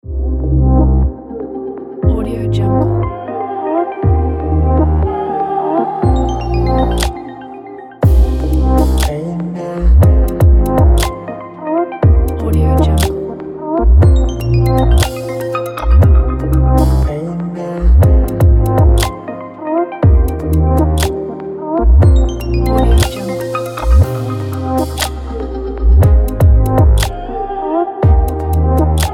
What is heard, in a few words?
ambience chillstep